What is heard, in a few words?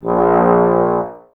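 Brass trombones A#; Miroslav Vitous - Woodwind Brass Ensemble; Tbs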